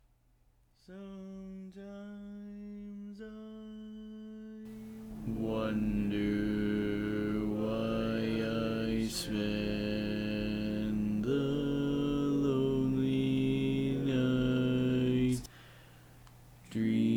Bass, barbershop

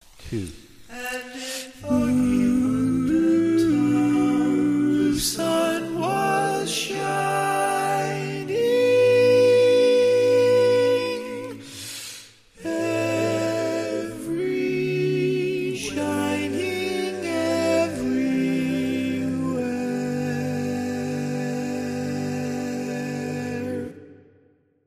Tenor, barbershop tags

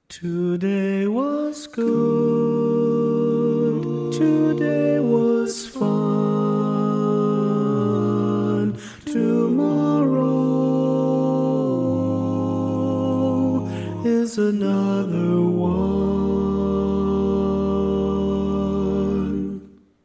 All Parts, barbershop

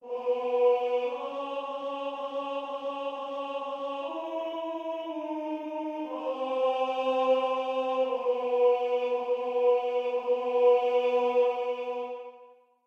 Barbershop Tags

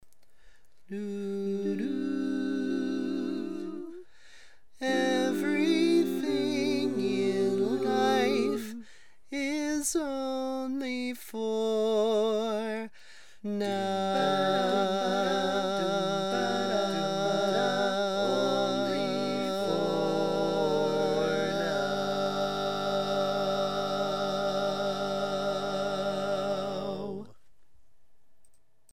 Lead, barbershop tag

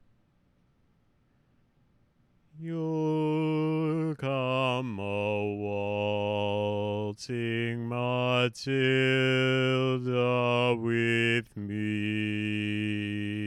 Waltzing Matilda, Bass, Barbershop; Maloney's Version; Arranger / Maloney

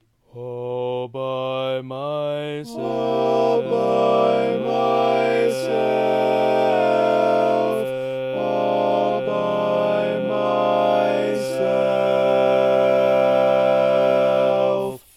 Bari, barbershop

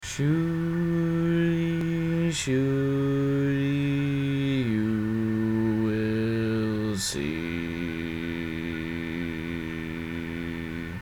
Surely Surely, Bass, Barbershop

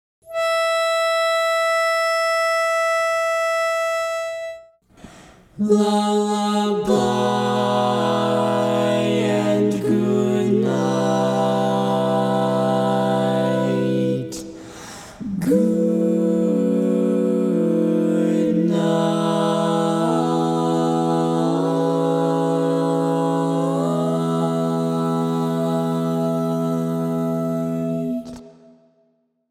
(Female) Lead, Barbershop Tags

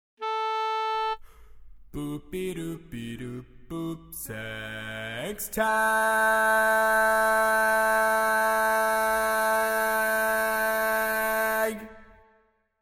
Bass, barbershop

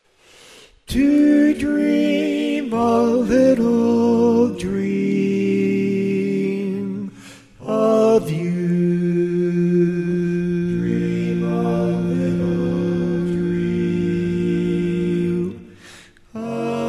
Lead, barbershop

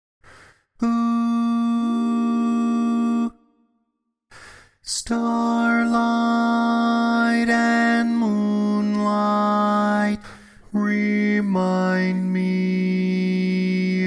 Bass, barbershop

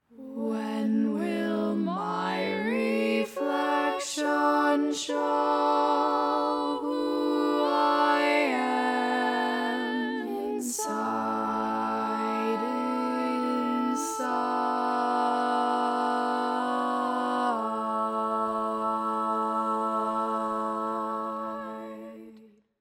Bass, barbershop tags

